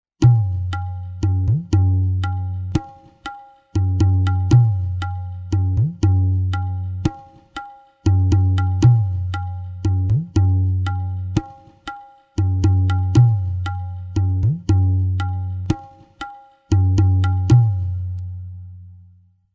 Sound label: upatala jhampaka, tala